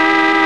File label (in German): Car-Hupe; World Racing 2